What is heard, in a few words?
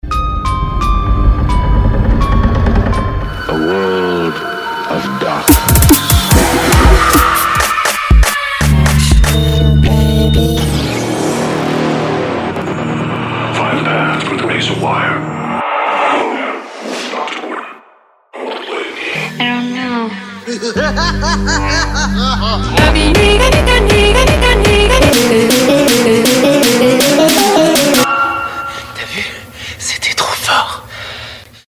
horror